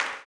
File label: CLAP, Blues Soul; Spectrasonics Vocal Planet